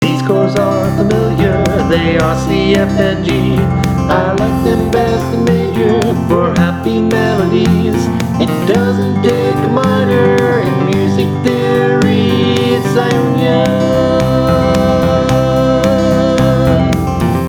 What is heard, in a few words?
Music Theory Ionian